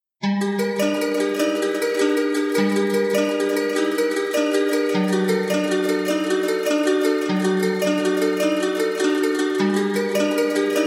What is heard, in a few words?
Delay; Bach